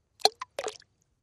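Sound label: Splash Sml Pebble Plop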